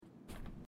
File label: put down paper sound; Life is Strange 2; gamesoundopedia